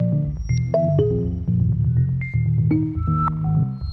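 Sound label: 122 BPM Sequence A major F minor Tonic D; innovative sequences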